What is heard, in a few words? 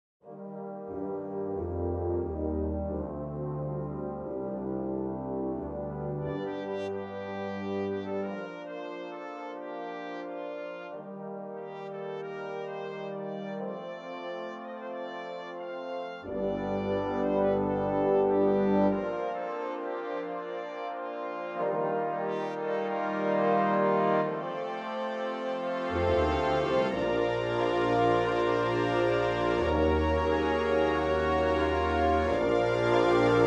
Fanfare